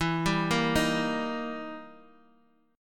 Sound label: E Minor Major 7th Flat 5th, Guitar, Open D; chord shape